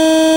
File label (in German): hupe lang, volvo 9900; Vehicles; AI volvo 9900; Freyfurt